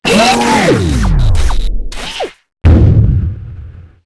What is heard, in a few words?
Exit Windows; 250 Desktop Themes; Quake II